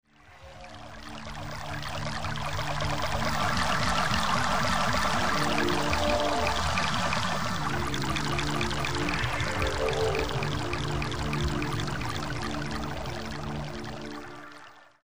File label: Ambient